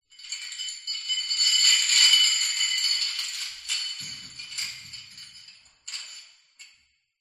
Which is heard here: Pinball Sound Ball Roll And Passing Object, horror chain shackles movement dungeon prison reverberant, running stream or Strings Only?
horror chain shackles movement dungeon prison reverberant